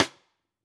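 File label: Pearl Piccolo Side Snare On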